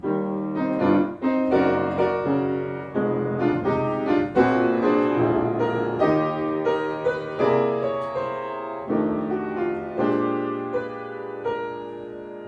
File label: Yamaha Klavier; YAMAHA PSR-e473